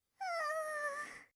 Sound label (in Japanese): hauu, breath